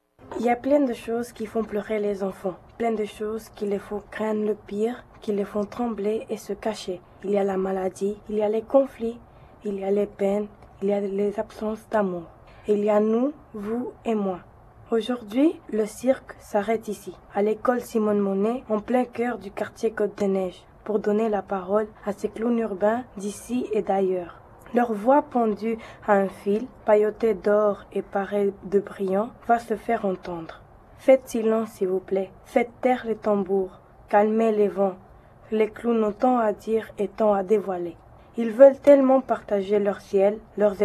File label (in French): enfant pleurs; Production sur la peur et les peines des enfants par des élèves dans le cadre de l’émission Radio-enfants; CINQ